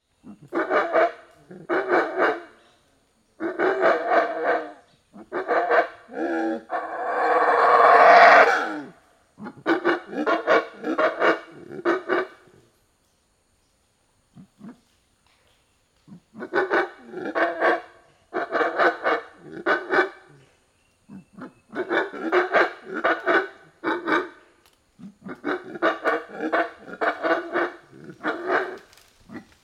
monkey loud calling in forest; animal; felix blume

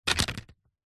leaf dry fall onto concrete

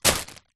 packet spinach air inside set down; zapsplat

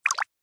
nature water drip single small; zapsplat

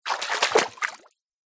foley footstep foot down into one foot water

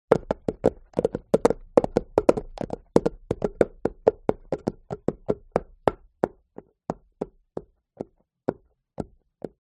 HORSE Hooves Clack Trot Asphalt Slowdown; ANIMAL; smartsound

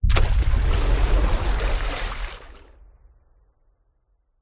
water whoosh impacts movements; george gazetis

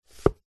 household book slide in bookshelf; zapsplat

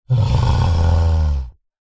horror monster groan moan tired or ill

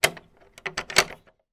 door shed keys lock or unlock

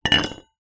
household flask metal down on table

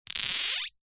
leisure toy buzz rattle magnets click vibrate

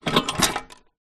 bread maker panasonic sd 2501 tin remove; household

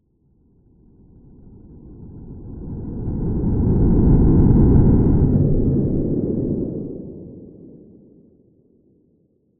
science fiction spacecraft fly by fast

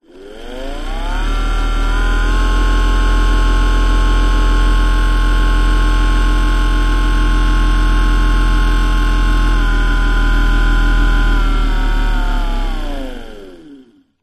electric massager on run off; zapsplat; household

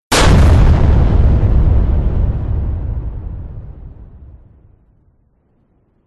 explosion big heavy distorted

